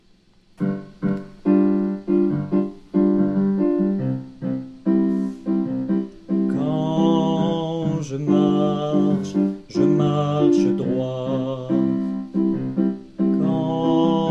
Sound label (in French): voix